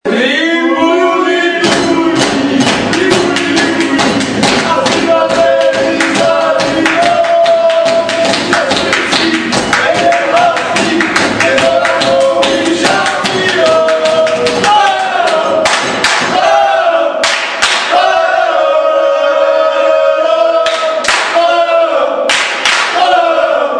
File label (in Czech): hymna